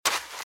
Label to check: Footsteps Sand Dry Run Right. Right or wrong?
Right